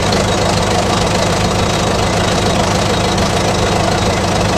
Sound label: combustion; Mindustry